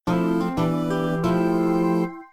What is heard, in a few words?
jingle